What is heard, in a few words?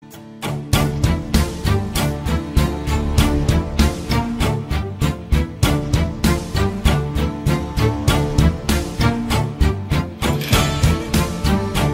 Rington Turkey; Sad